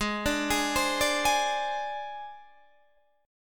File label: G sharp Major Flat 5th, Guitar, DADGAD; 18,17,18,17,17,18